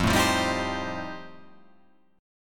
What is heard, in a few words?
F 9th F, chord